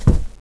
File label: carpet; valve